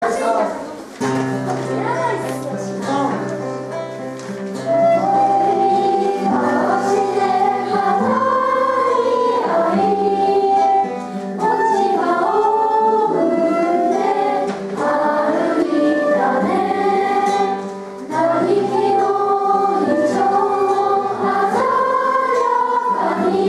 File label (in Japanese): 尺八・ギターの伴奏で教科書に載っている歌を児童と一緒に; 最後に尺八・ギターの伴奏で教科書に載っている歌を児童と一緒にえんそうしました。; 小学校・音楽鑑賞コンサート; 子どもの歌声; 貝塚市葛城小学校